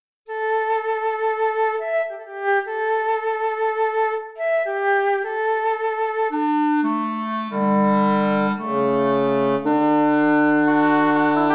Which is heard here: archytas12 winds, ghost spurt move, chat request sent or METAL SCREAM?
archytas12 winds